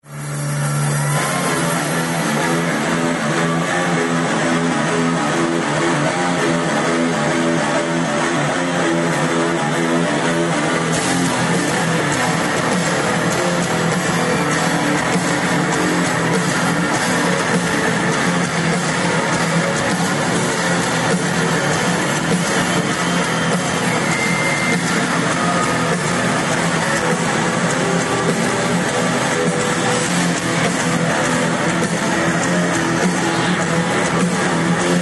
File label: Riff